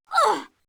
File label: hostage pain